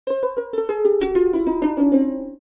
Cartoon descend

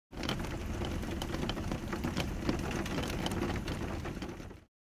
Heavy rain hitting window

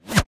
Sniper bullet pass by

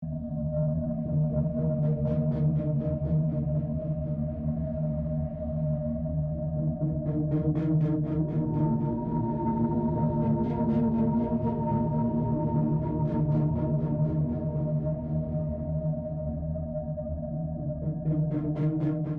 Sci-fi soundscape loopable alien atmosphere

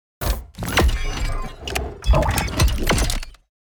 Sci-fi gizmo metallic sequence futuristic

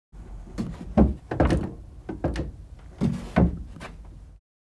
Truck cabin movement interior noise

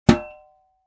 Cooking pan light hit impact; utensil

